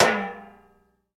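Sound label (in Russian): light hit on metal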